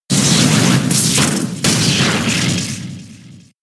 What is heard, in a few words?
zing zap